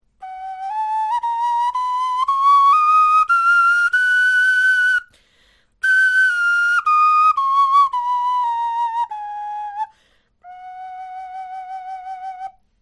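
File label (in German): tonleiter, Knochenflöten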